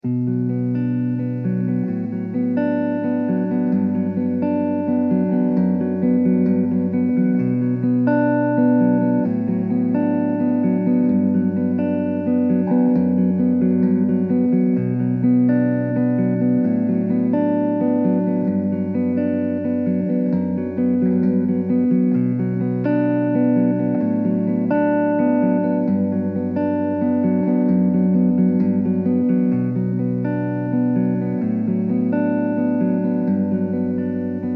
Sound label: Bm 130 elec GTR arp